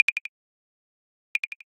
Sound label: Low Health Alarm